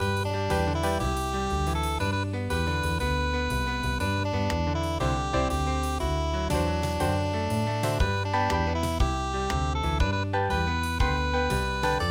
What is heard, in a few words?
harmonica blues, midi; Nobody Knows The Trouble I See